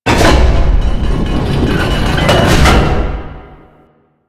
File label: Portcullis Door Open; Warframe; Entrati